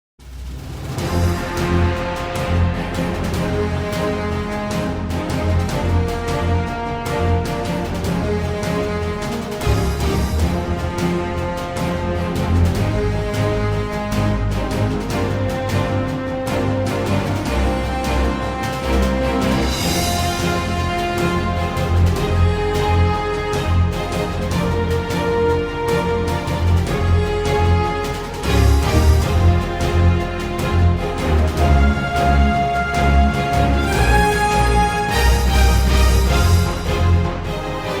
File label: harmonic battle